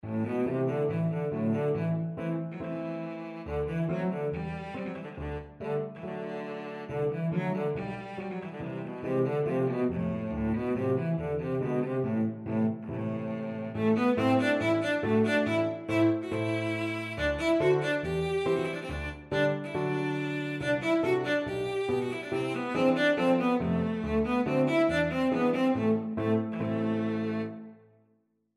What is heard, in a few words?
bartok romanian folkdance, cello